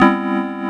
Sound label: ep chorda; Digital Sound Factory Xtreme Lead